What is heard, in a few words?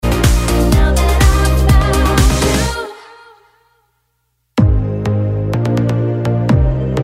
B Vox